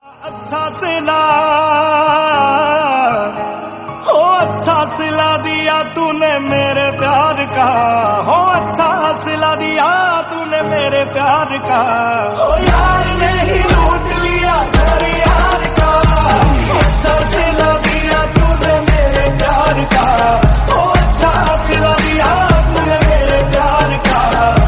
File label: sad hindi song tone ringtone; bollywood